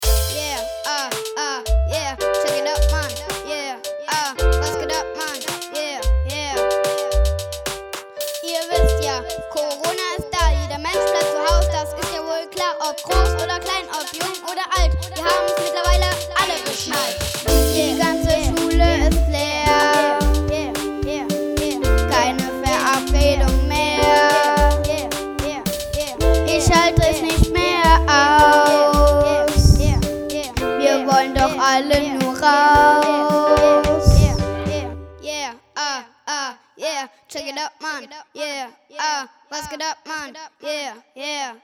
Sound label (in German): corona rap